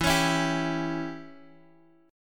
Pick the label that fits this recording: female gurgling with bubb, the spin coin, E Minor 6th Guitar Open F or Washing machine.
E Minor 6th Guitar Open F